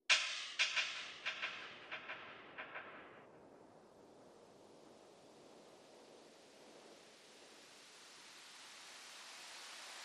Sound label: Noises